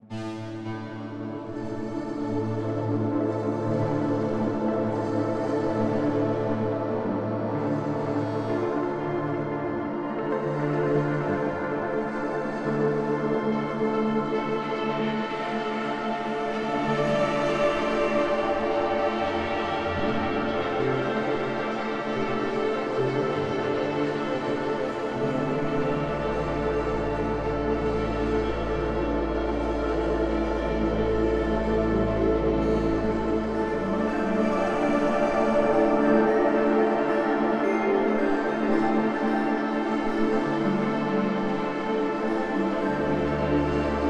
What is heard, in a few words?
DRONE; escape academy